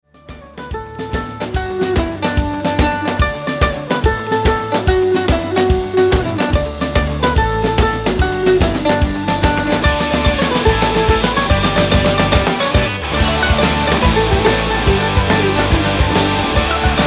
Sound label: Jig